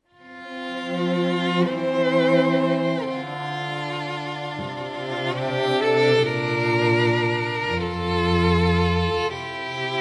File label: violin viola cello trio